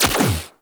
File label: Crew ship Turret Fire; Warframe; RailJack; Corpus Crew Ship